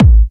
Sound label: Trance Kicks, Bassdrums